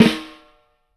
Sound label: CAIXA; AKAI S-Series CD-ROM Sound Library VOL-5